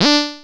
Anasound Short hi D; Sonic Foundry (Sony Creative Software)

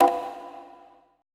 CONGA 6 R; BPC DRUMS

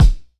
Punch, Jomox Kicks; ShakeTheRoom; Airwindows

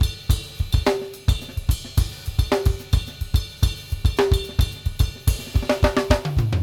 6-8 GRVE, 216; Sampleheads; New York City Drumworks VOL-1

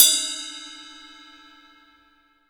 20 CUP, 20INCH SAB RIDE

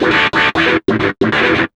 GTR, Chemical Beats

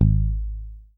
SA4MUTG#1, Bass; Sampleheads; Will Lee Bass Library

